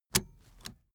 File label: car seatbelt fasten hard